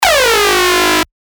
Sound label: Acid Shot G#, synth shots tech house; iCan't; revealed